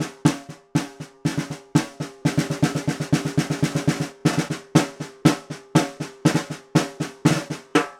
Vintage Ludwig Snare, Percussion; Appalachian; Continental Drift Aftershocks

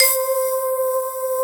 SYN K-2000, Roland

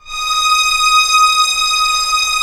STR D#4 PP; Sound On Sound; Peter Siedleczek's Orchestra

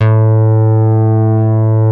MOOG A1; E-MU Formula 4000 Series Vol. 3; Analog Odyssey

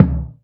BD, Bassdrums, Roland TD-20

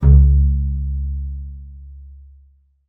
C#2, bass pizz; Xsample VOL-5

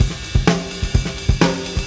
Fusion 128, Beat Loops; Zero-G; Monster Beats

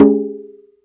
bata; AKAI S-Series CD-ROM Sound Library VOL-5

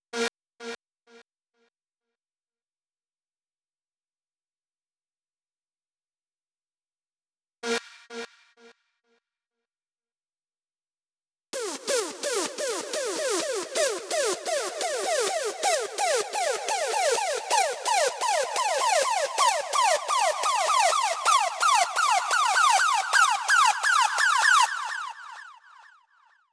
Drum Loop Tonal Synth A#, 128BPM; VEC5 Drumloops; VEC5 Multi Loops 128BPM; Loop Elements

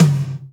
TOM; Best Service Gigapack 2

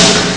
desert snare; Amiga Soundtracker Sample Packs

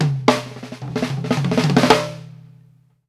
STANDARD, FILLS 108; Sampleheads - New York City Drumworks VOL-1

